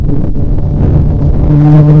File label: Lapidarius queens, bumblebees